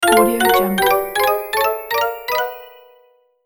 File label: sunshine game tally score